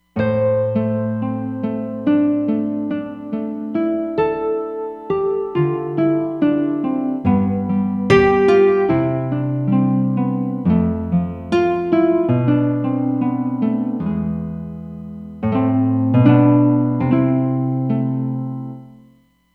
Mellow Nylon, Roland Fantom XR

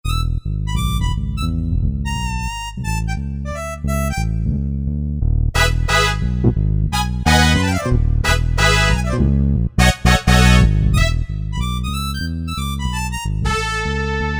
Bss Hrp Br, Korg MSC-9S Organ

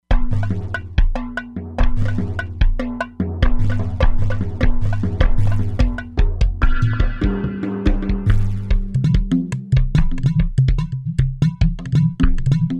World Drums, E-mu Proteus-3; Factory patches

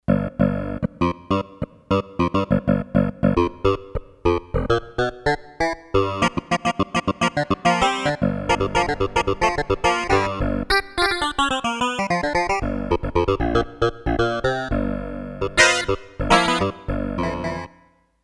Funky Clav, Roland D-10; Factory Patches

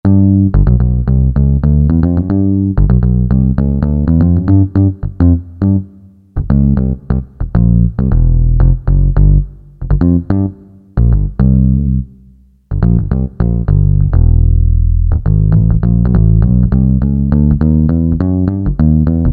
P. Bass, Korg MSC-3S

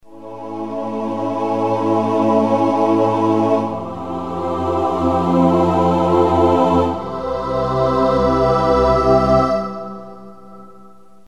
Choir Pad

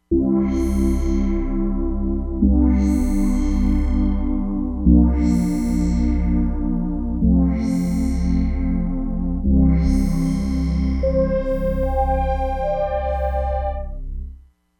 DIGITAL PAD, Crumar Bit 99; Factory Programs

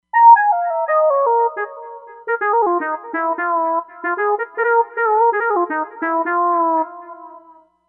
Reso Lead

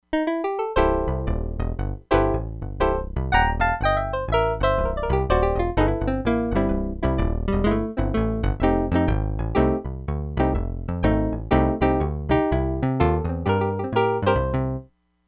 BRIGHT PIANO, Crumar Bit 99; Factory Programs

